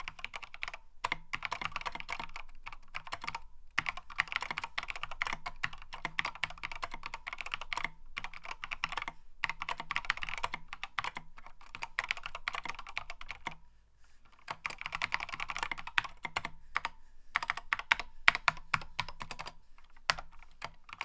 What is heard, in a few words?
WASD v2 MX Black